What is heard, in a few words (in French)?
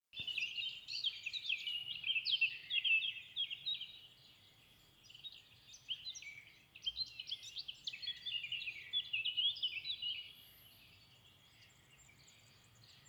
chant oiseaux